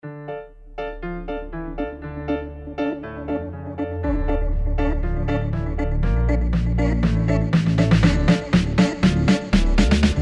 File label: TRUMPET